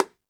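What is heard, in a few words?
Jungle Percussion, Drum Machines; 300 Drum Machines; E-MU Pro-Cussion-1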